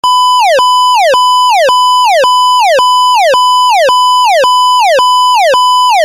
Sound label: Siren Tone POWERCALL